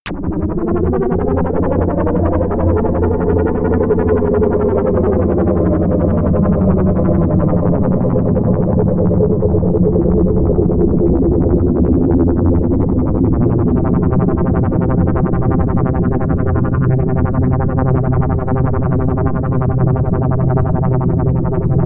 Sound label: atmosphere ufo